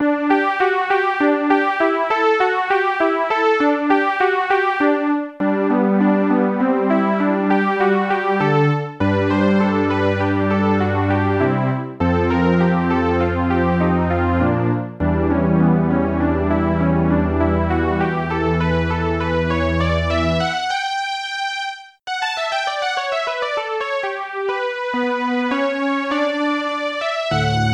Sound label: little prelude in G major, chiptunes